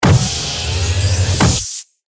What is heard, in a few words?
Sci Fi Air Lock Door Seal